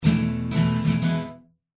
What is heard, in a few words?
Acoustic Guitar